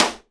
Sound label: SD STICK, Live Snares; E-MU Producer Series Vol. 8 – Platinum Phatt; PlatinumPhattCD1